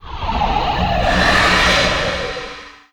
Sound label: taunt